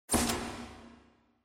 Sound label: TV Turn Off Reverb